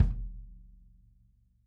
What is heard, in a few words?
kick